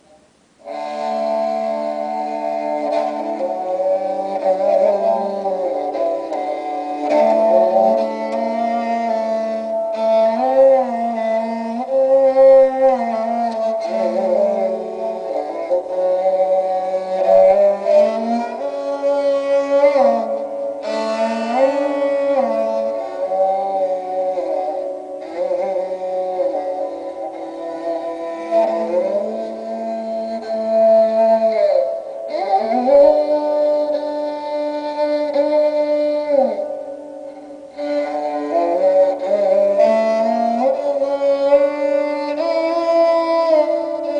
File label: Sarangi